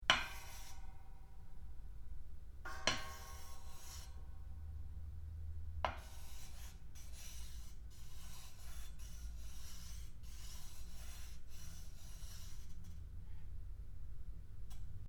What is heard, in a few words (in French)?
touille marmite o chocolat